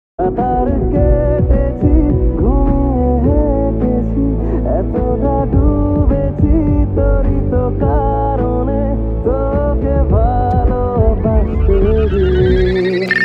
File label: lofi bengali ringtone